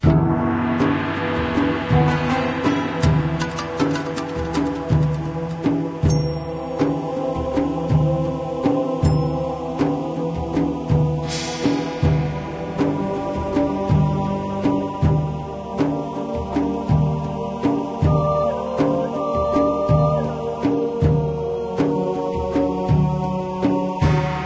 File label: mournful music